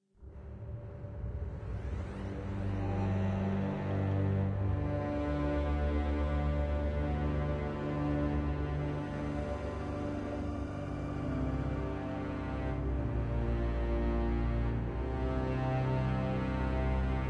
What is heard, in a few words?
player turn spooky; devils demons android game music